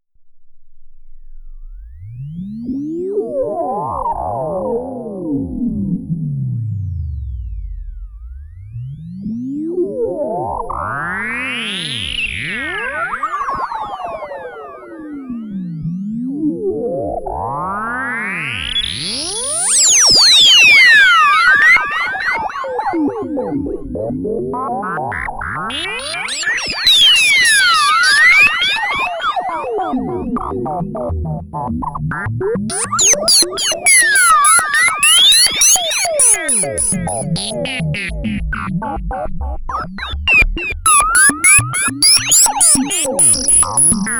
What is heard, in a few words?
Self Reso delay